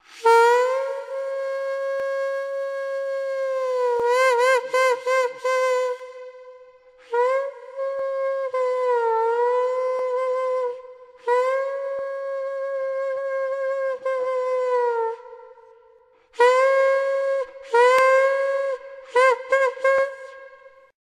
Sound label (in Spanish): Erkencho Solo